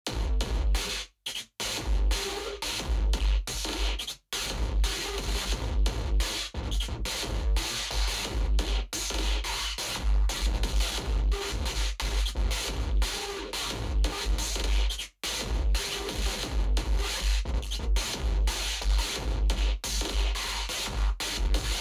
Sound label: distorto, music